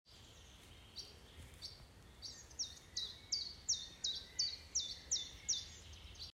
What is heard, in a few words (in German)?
Zilpzalp